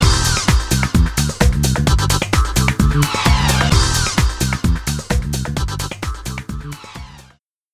Disco; Disco Wreaking Ball; Skylanders Giants

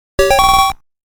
Credit Sound; Namco Arcade Sound Digital Collection Vol. 2

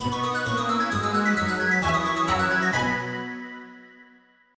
Completed; Micross; Sanrio Characters Picross; 3ds

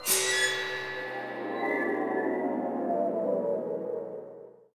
Hum 2 Flourish; bolt

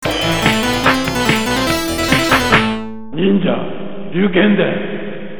Round Clear; Shadow Warriors; Ninja Gaiden; Ninja Ryukenden; arcade

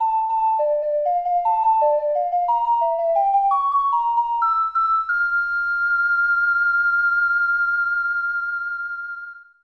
Ocarina; Legend of Zelda The Majora's Mask 2000 n64

